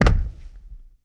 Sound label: Heavy Thud, Hand To Hand Combat; Sony Pictures Sound Effects Series